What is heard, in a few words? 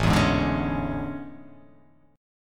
A Major 11th, down; AM11; strummed; JGuitar